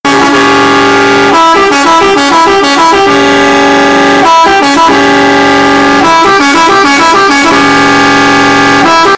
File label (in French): traid tone horn 3 trompes chrome